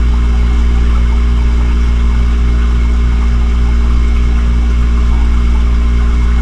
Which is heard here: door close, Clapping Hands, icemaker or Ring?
icemaker